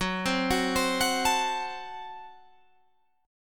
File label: Gb Diminished, Guitar Open G; chordshape; down